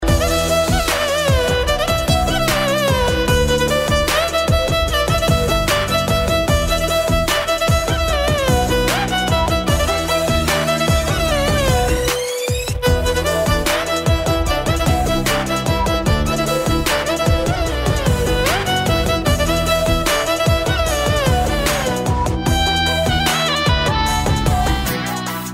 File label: Instrumental Ringtone